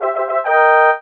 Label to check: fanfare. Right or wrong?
Right